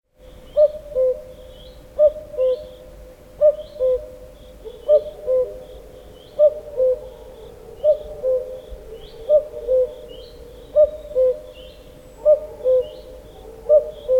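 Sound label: obyknovennaya kukushka golos, common cuckoo voice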